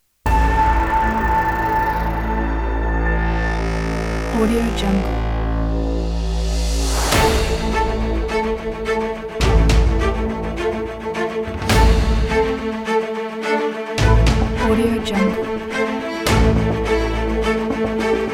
powerful cinematic trailer

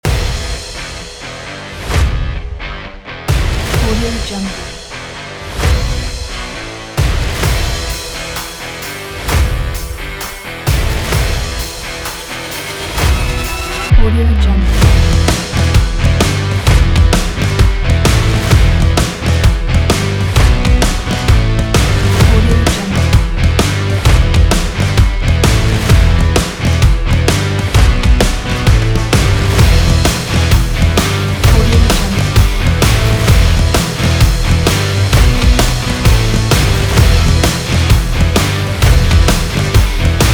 in action sport

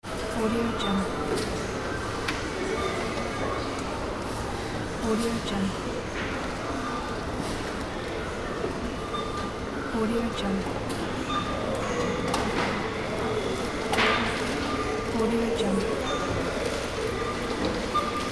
supermarket ambience; shumatar

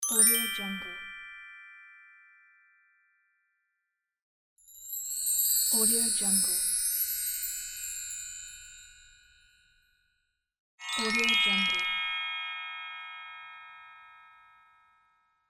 magic wand

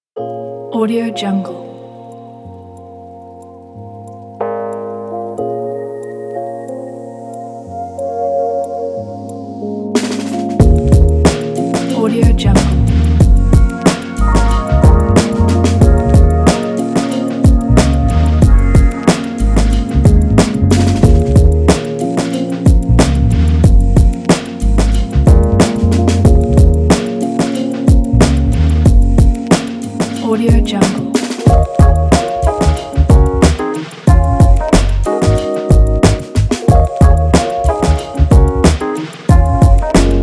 autumn leaves falling

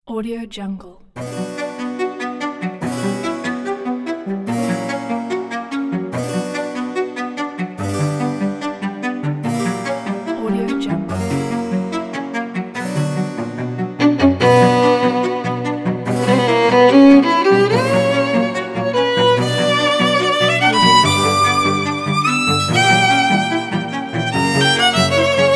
cinematic baroque music

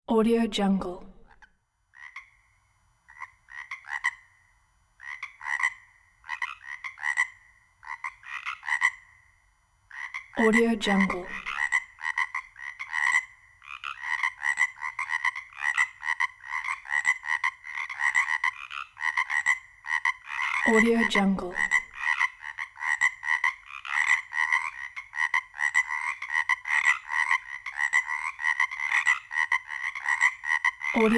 frogs

